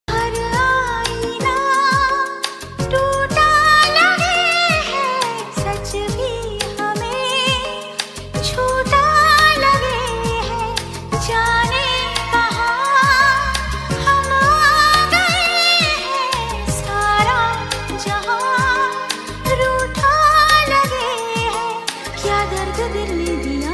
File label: female version